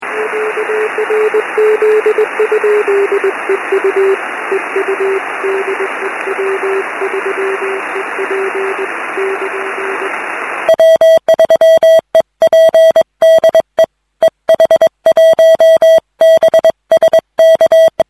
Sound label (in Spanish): realizando el comunicado en CW; W3EP; FN31 - IN52 entre continentes